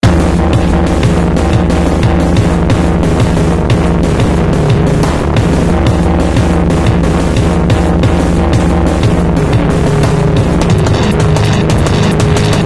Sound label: Death Noise